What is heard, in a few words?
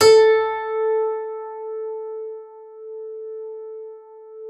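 harpsichord A4; KLAVICYMB; Xsample VOL-8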